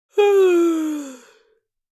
Adult female exaggerated yawn

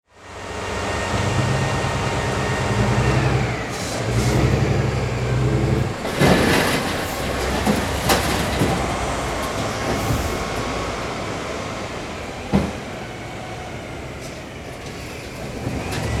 Garbage truck loading trash garbage collection; construction vehicles

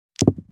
Dice thrown on a casino table rolling

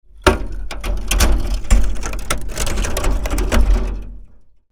Metal gate rolling sliding; squeak sound effects

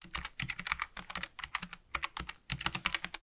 Logitech keyboard typing

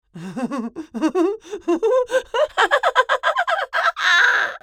Hysterical elderly woman laugh

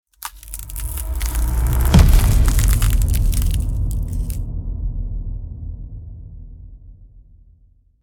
Cinematic ice freezing transition

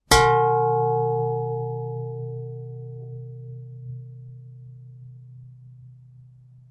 Metal hit hard impact

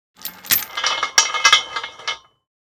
Bicycle chain lock unlocking

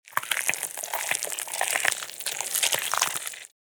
Flesh gore and blood movement

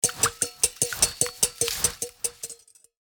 Steel whisk stirring mixture; cooking

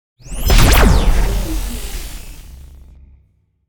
Intense shockwave powerful impact

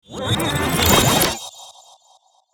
Time travel reverse whoosh

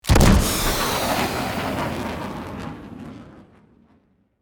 Rocket launcher single shot; artillery

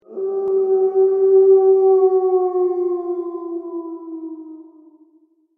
Wolf howling strong and wavering; animal

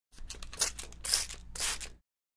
Water sprayer garden spraying plant leaves

